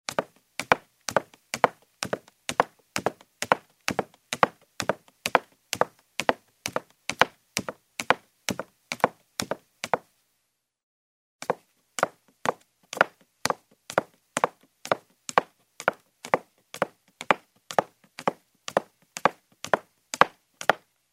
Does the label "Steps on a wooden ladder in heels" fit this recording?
Yes